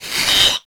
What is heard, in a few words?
injured; spider